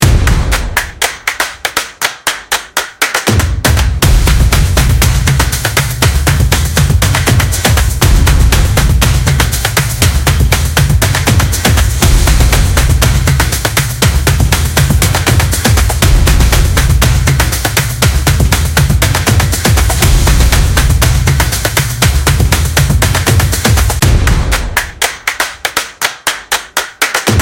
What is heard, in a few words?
Action Stomp, energetic percussion music